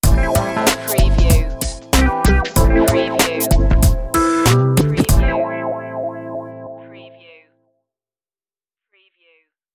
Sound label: funky summer stinger